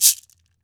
CABASA GOLD; Brazil

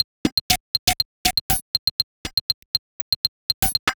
Odd Glitch, 120 BPM, Percussion

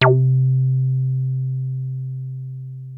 303 C3, TB 303

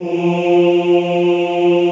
OOH F2, Classical Choir; Best Service; Extended Classical Choir

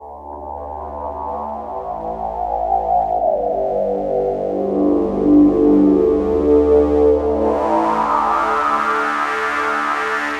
Phased Pad; Sonic Foundry (Sony Creative Software); Loop Noir

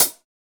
HH 1H L, DRUMKIT

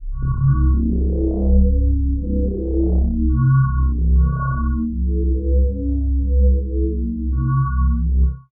weird c#; Chillout (ambient1&2)

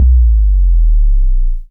Sfx, Trance; Zero-G Trance Inducer; General Sfx

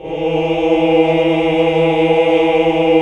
HAL OOH OOOH Uni; Sound & Vision - Gigapack II CD 1 (Roland)

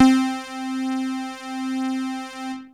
Techno leads, hits and riffs

